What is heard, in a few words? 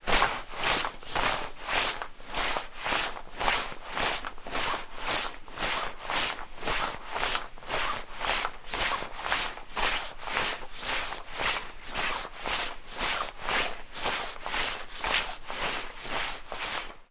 foley coat jacket arm movement walking